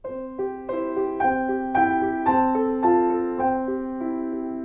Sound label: twinkle